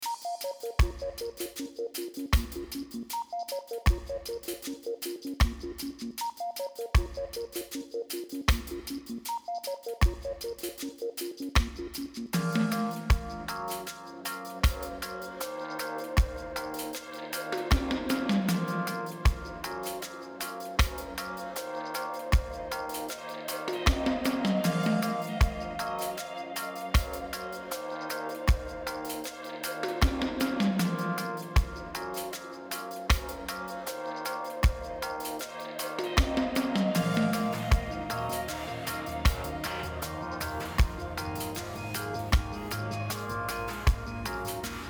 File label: galaxy reggae